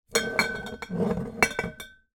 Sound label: wine bottles x3 slide on table bang together; foley